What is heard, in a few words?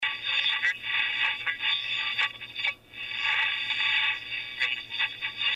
earthquake